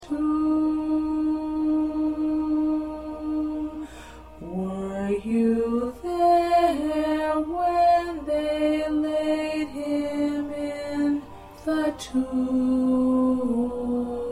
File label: Alto 1; Were You There